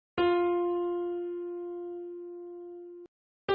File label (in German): Ton, Klavier einzelne Toene